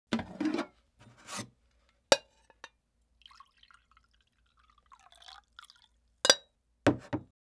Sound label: Pour Coffee PU Down; Fairlight Sound Lib